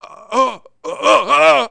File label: WELDER AFTER FIRE; Messiah; The Games Machine 76